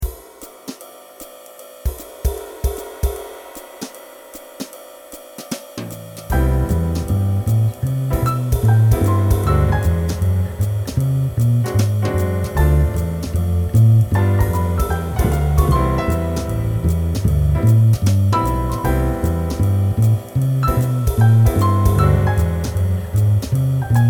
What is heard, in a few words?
Jazz Trio